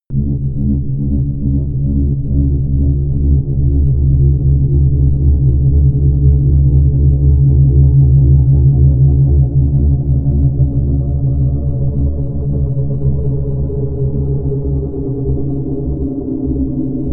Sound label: drone calm growling